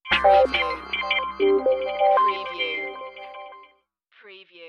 Scifi Audio Logo Ident, Junky, Slightly Broken Signals